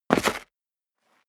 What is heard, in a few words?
step sneaker concrete grit jump; schritte